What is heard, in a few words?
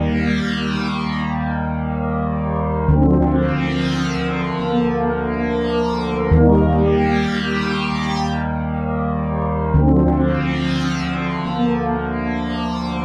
Phaser Bubbly Phaser Synth Dry; Chromacycle; Syntheway